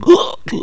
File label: gurp